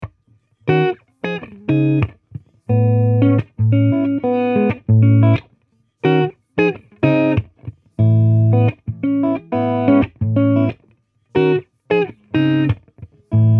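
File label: Guitar Dry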